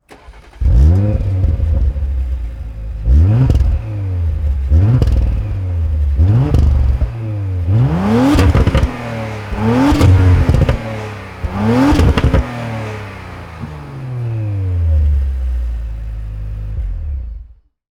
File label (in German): Audi RS4 Motor; rs4 avant; audi sport